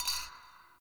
Bar Glass Move; Warframe; Nineteen Ninety Nine; Hub; Hub Pub

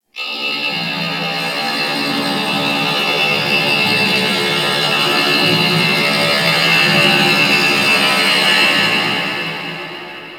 Space Trench, Space Battles Ambience; Warframe

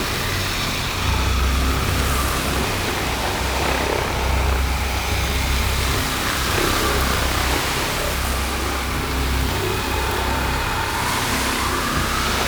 Sand Funnel Loop; Warframe; Man In The Wall

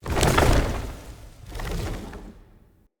Root Shield Hit; Puzzles; BeanStalk

